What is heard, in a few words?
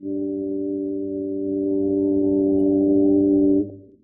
Horns